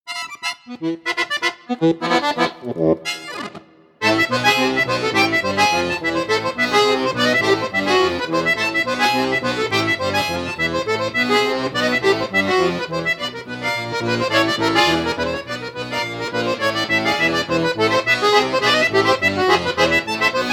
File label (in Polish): Akordeony TRIO